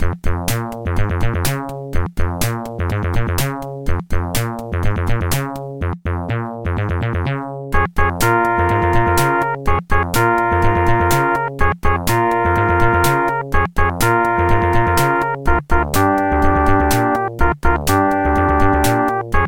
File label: Level Completed; super noah s ark 3d; dos